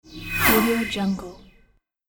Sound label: scifi transition